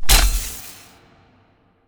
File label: sphere flashlight on; Portal 2